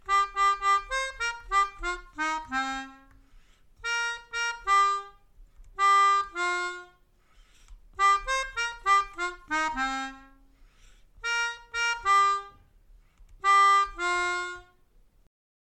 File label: Melodica